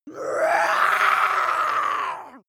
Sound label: witch scream scratchy long